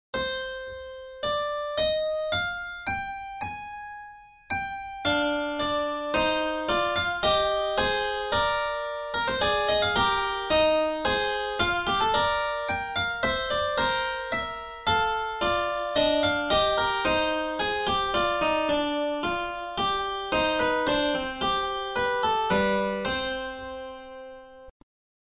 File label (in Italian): Fuga Alla Settima; Harmonie Beethoven